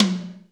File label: Toms